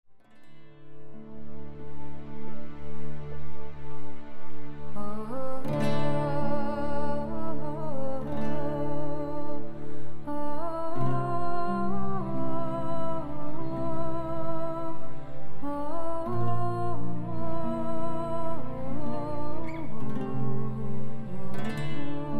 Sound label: Celtic Fantasy